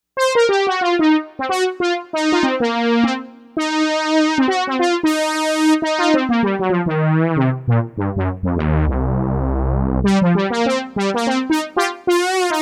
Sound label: PWM Lead, Novation UltraNova; Factory Patches